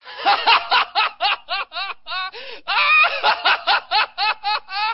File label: Belly Laugh